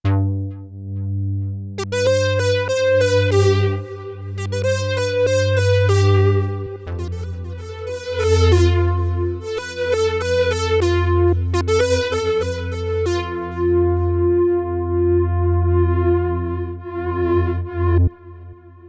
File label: Nina Lead; Melbourne; New Emerson